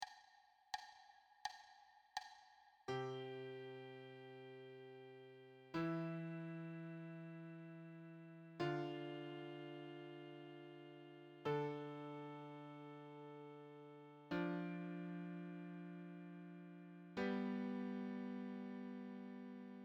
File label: wholenotes duet